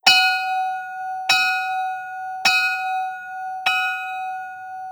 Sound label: old fashioned school bell; daniel simon